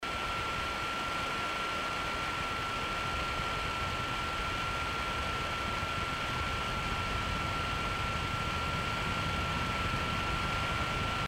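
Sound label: noise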